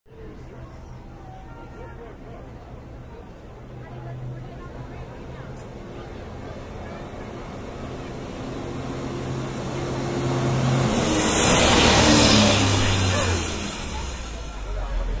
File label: Shttle Plls Up, Shuttle bus pulls up with crowd sounds.; Busses; Vehicles